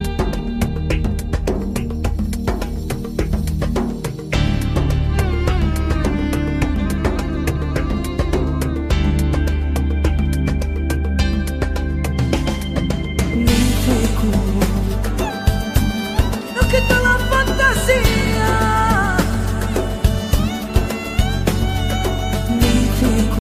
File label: turkish ringtone